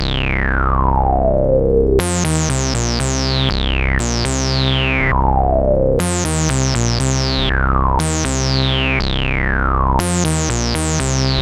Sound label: twosaw